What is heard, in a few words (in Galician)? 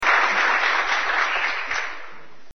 aplauso